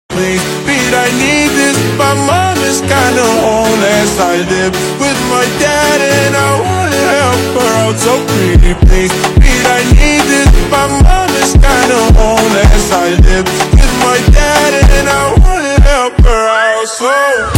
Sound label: FUNK Slowed